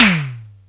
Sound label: Jomox Xbase888